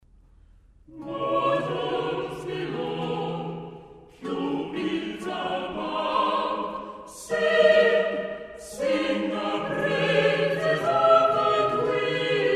Choeur des graces Mortals below

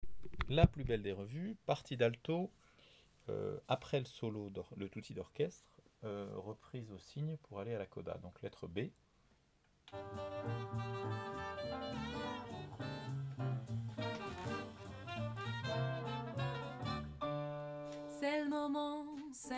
Alto; Pinocchio

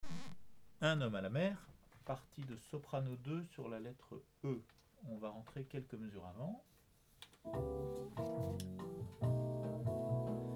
Sop 2; Pinocchio

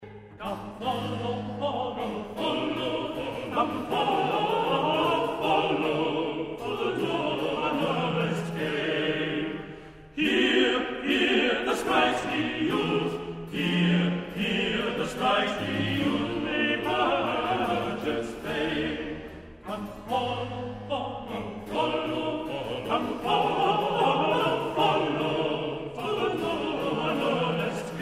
Choeur Come follow the noblest game

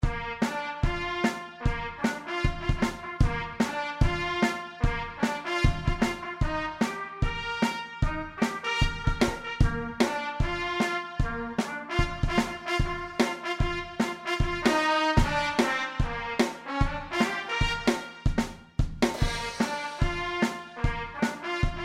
trompette batterie

